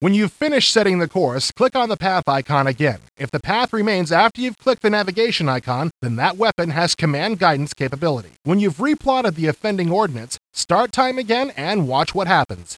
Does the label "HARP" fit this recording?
Yes